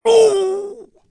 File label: death